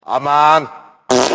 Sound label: Burp